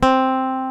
Gtr C3; Mo' Phatt; Digital Sound Factory Mo' Phatt

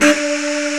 Breath D3, lead; Xtreme Lead-1; CMI; Digital Sound Factory Xtreme Lead

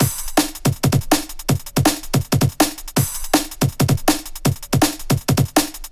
162, Jungle Breaks; Gateway; Jungle Warfare 1&2

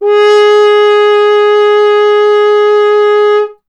F. Horn; Miroslav Vitous; Solo Instruments VOL-2

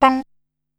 "Pan", Single Hits

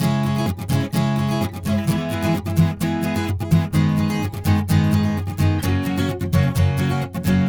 Acoustic Latin Guitar 128BPM C#min; Vengeance - Essential Clubsounds Vol.4; Guitarloops

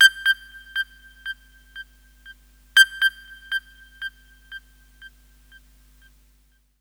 SYNTH R; USB Soundscan vol.31; Miro s Grooves; AKAI

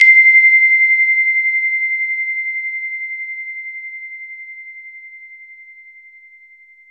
TINE EPIA, KURZWEIL; BASIC-KW2000

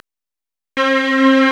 STRNG; CMP - Analogon

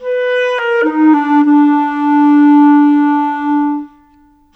Clarinets, Cinematic; Sonic Foundry (Sony Creative Software); Orchestral Series VOL-3 - Cinematic

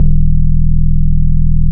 BAMBALACHA BASS, RAGGA, Bass Analog & Synth; USB Soundscan vol.12; AKAI

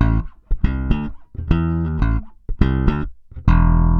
SA BS AM, BASS SALSA

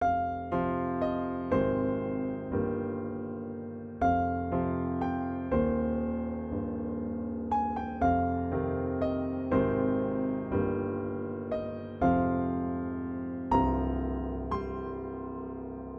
Piano Loop 120BPM Fmin, classic; 99Sounds; Monosounds; Music Loops; Instrument Loops; Pianos

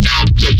Growl Bass; neuro; BIG5AUDIO

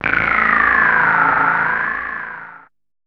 DR. LOOP, TRANCE; X-Static Goldmine 3

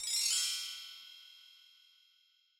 Bell Tree; Yamaha DJX-2B

